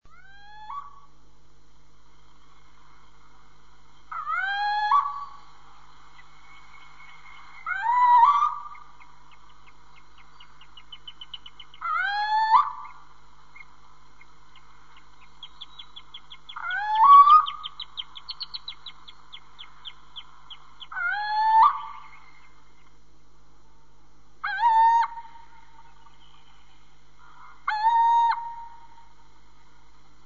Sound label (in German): steinkauz